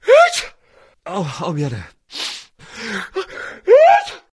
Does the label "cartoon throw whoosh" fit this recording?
No